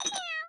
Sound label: bowl drop